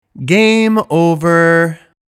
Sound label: Game over